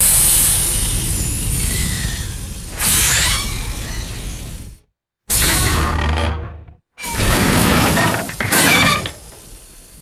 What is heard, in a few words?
searing air burst and metallic groan